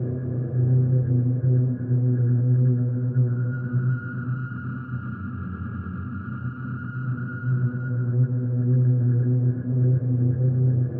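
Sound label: Ambient Dark; Jack the Ripper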